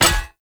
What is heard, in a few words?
Hammer Impact Shield; Weapon Impacts; Player; Combat